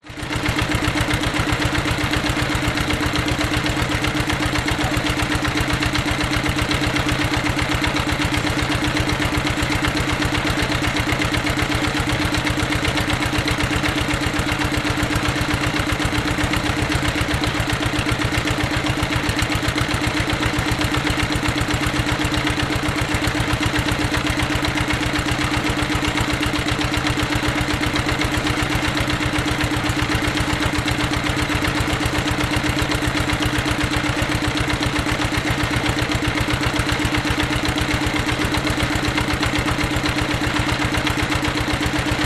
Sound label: industrial deep water drilling machine old engine